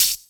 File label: Hhat, HiHat; Equipped Music; Loungin House